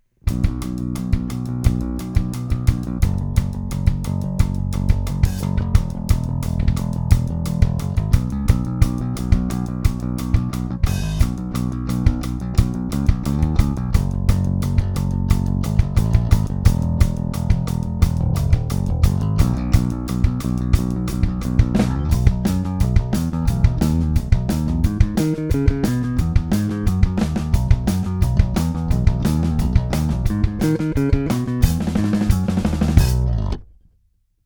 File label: Punk Mix